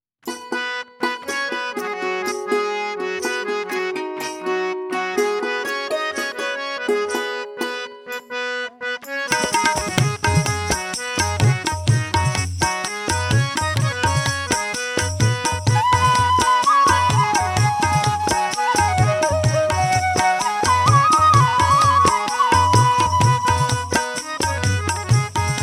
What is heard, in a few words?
Gents Karaoke B Pancham